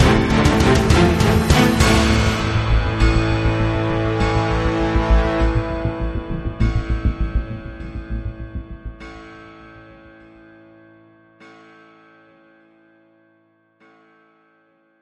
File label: Gothic Action Fight Stinger; bbc